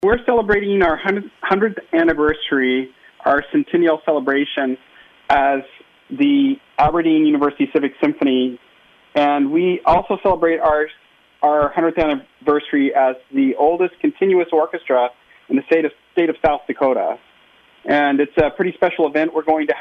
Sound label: Birthday Concert